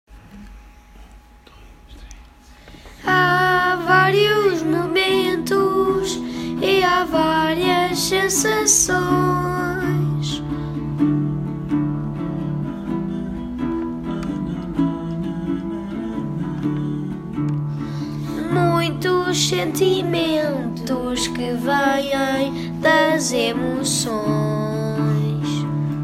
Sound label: Emotion song with prompts